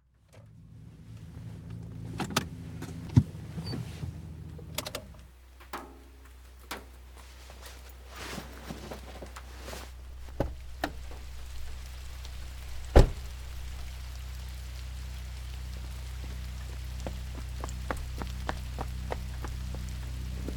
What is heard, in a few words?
rain sfx, cyber-noir atmosphere; f4m; strangers to lovers